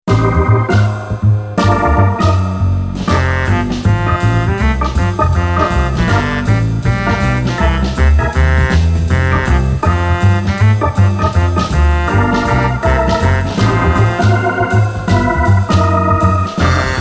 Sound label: The Muffin Man Instrumental